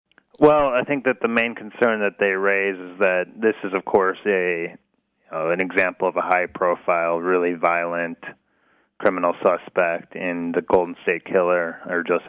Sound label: Horn